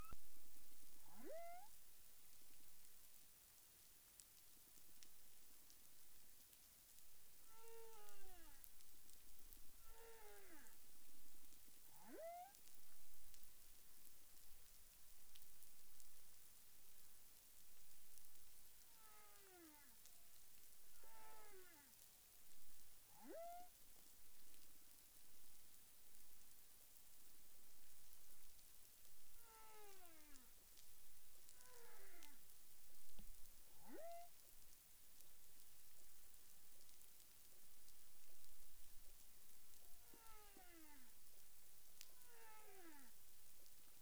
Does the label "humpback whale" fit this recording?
Yes